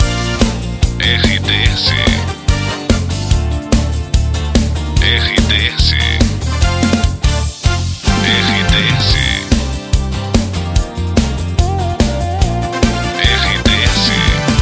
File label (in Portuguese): trilha para locução; Pacote Princesa FM, Rodeio/SC. Estação com características popular